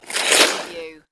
Snow and Ice, Ice scrape downhill

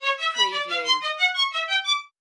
Music Strings Ascend

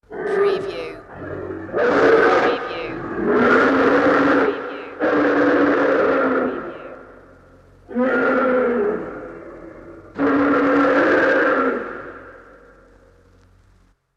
Dinosaur Roaring

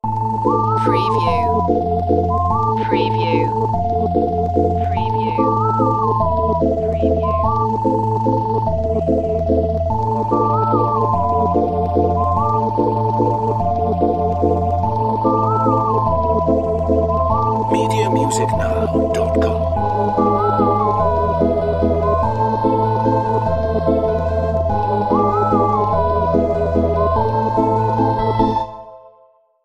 funny little things

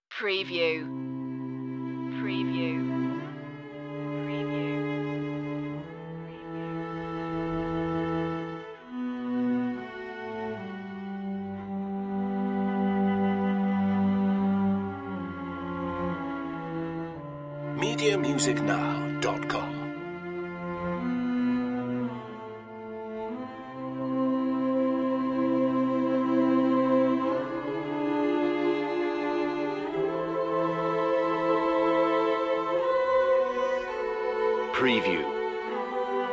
remembering no piano

